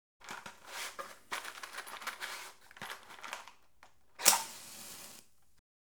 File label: Match, Being Struck; Household; BBC Sound Effects Library